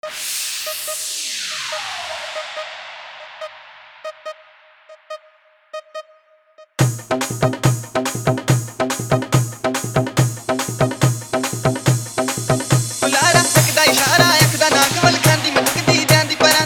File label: Faadu Remix